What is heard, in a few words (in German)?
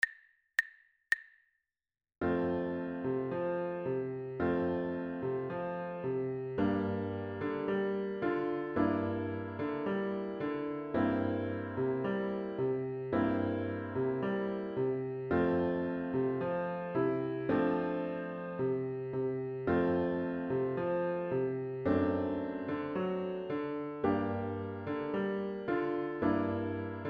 Tango